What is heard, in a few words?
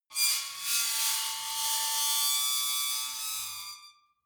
metal squeal bright; metall